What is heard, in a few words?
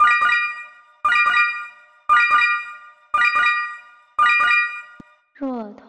alert